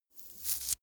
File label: mandarin slice separate